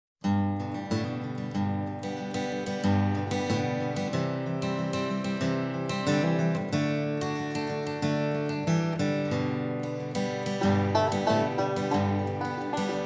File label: jingle bells